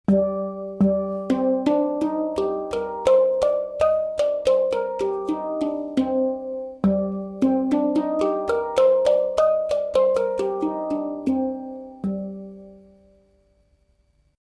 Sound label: Hang drum Pygmy